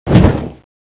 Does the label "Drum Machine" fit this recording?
No